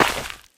cleats dirt, player footsteps

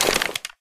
rifle helping hand extend; weapons; source engine; left4dead2

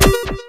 hitsound retro

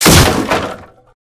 wood furniture break; Source Engine; video game; physics

